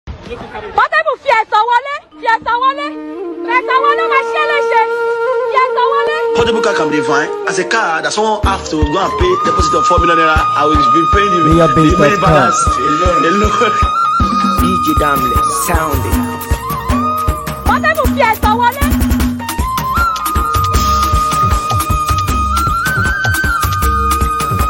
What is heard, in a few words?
Portable Car Cruise Beat